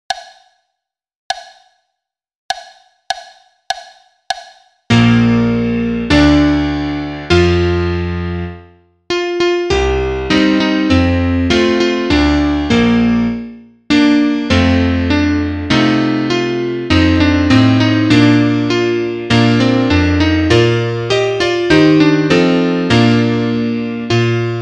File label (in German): Klavier Solo, trompete; Etüde; Etüde Seite 40 - Nr. 80